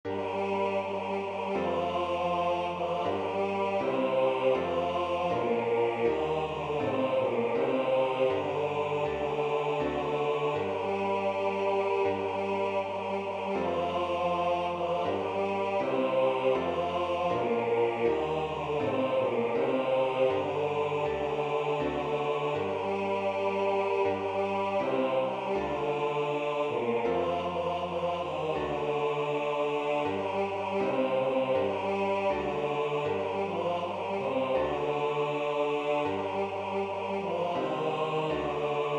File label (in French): Qui veut chasser une migraine basses